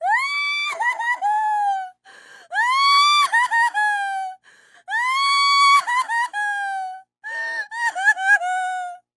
Cry Female; Blastwave FX

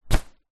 foley raincoat jacket grab hand; zapsplat

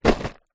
food candy inside card box down on surface; zapsplat

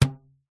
foley rubber band ping; zapsplat

